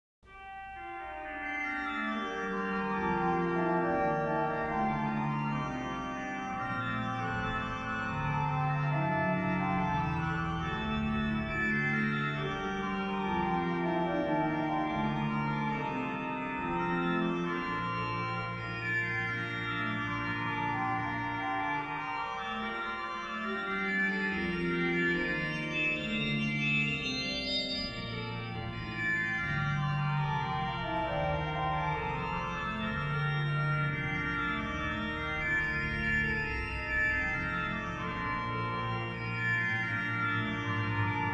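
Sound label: Bach Orgelbuchlein, organ; BWV 607 From Heaven High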